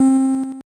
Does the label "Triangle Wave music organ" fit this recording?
Yes